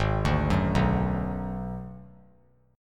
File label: Ab Minor 7th Flat 5th Ab, down, 3C, 3F, 3A, 3D; arpeggiated; JGuitar